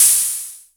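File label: electronic hi-hat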